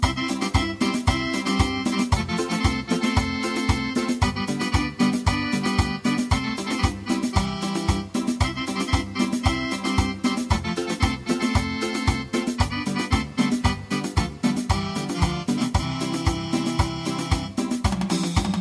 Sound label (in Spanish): Punta; ritmos